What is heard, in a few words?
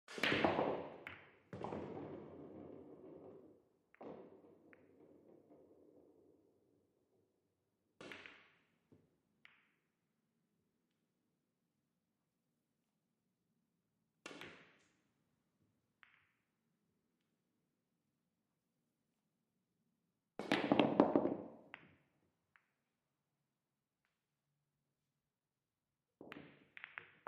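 Pool Game Billiard Balls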